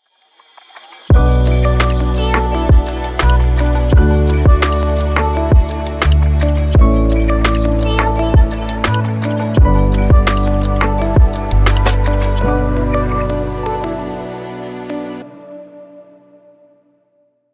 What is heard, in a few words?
inspirational travel timelapse